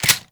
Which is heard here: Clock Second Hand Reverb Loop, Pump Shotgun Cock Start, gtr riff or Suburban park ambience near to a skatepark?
Pump Shotgun Cock Start